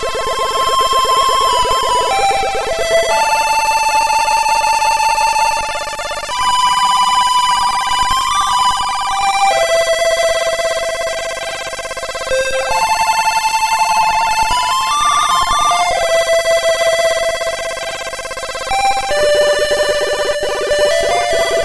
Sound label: Humming